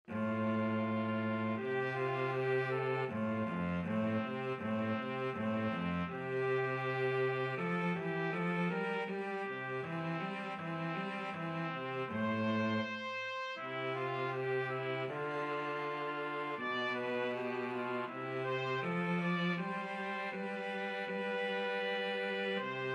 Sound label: mozart TPT VLC, trumpet-cello duet